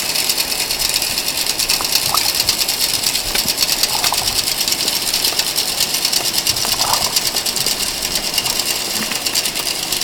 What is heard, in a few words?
calibration listening, ferries; Haro; WS Ferry; anthrophony; vessels